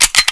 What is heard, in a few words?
reload pistol Chamber